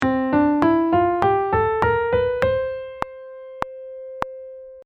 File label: bebop dom